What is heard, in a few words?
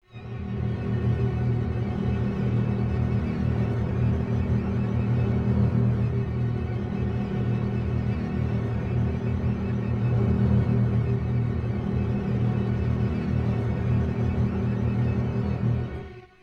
Final Battle - Combo Pad; Bee Movie Game